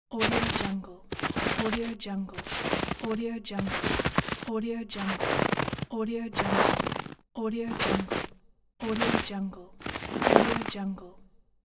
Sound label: footsteps on snow; epx lab